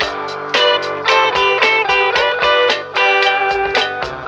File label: guitar playful, loops; Phonky